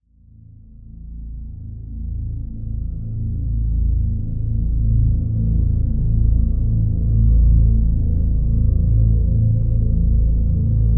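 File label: drone A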